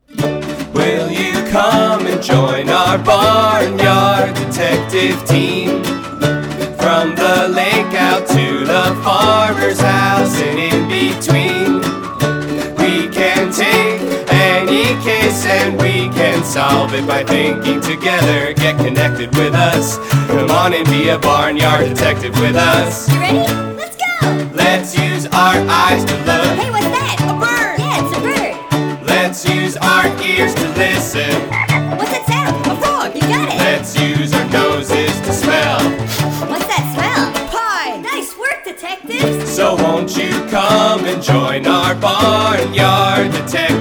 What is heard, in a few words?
Chickie Pop